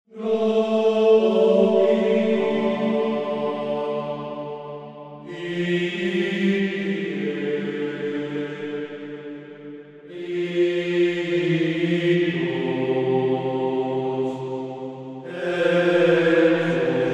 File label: gregorian chant